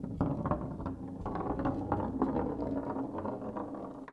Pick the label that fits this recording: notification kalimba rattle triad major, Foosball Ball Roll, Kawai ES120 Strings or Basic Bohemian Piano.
Foosball Ball Roll